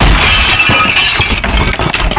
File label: Glass, Foley; Cymatics; Foley Wavetables Vol 1; Serum